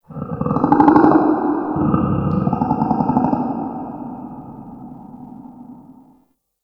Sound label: Day Croaky Creature; Ambience; One Offs; Glades Of Andurin